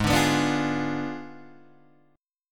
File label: G sharp Diminished 7th G sharp, Guitar Open G; 6,7,9,7,6,6